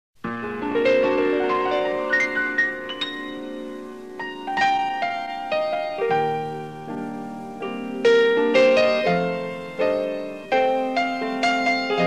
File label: Piano Orchesta; Sai Yoke; Lao Kraun